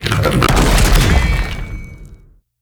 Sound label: skill rock boost